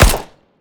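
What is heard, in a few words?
Weapons P90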